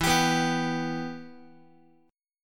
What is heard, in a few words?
E-Minor, Guitar; strummed; JGuitar